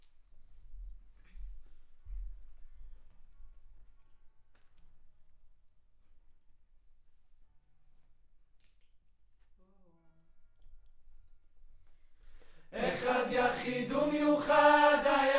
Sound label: Choir